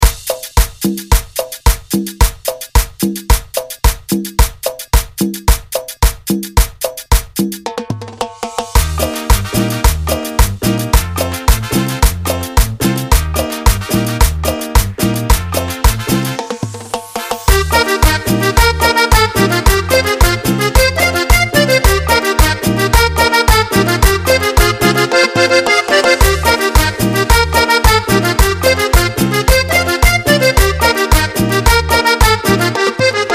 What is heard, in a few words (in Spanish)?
Cumbia Tabasqueña